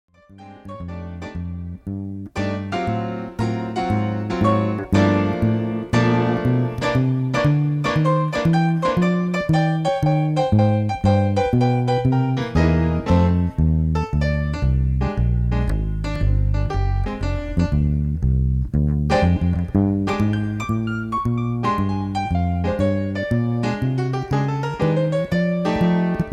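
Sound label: PNO, piano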